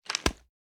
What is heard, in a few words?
inspect; p99; tacz; daffas arsenal